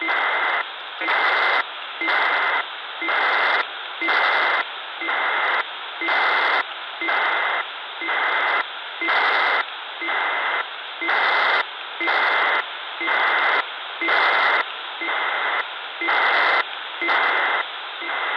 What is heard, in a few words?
RS41-SG 402800kHz SSB